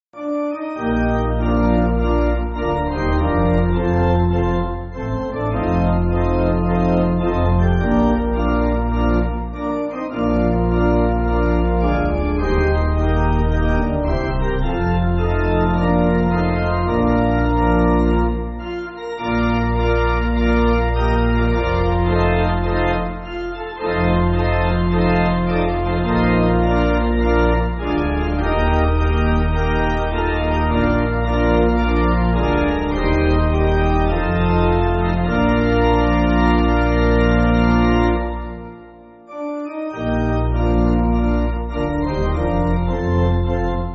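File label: Pipe, Pipe Organ, Music Backing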